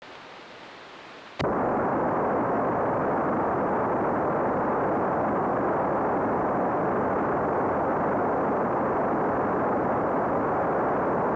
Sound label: signal